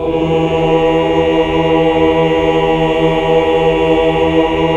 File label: AAH 2 5 L; Best Service Gigapack 1